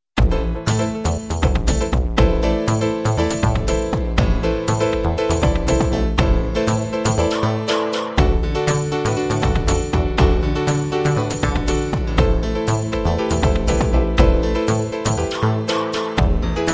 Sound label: Swinging; SverreHHuseby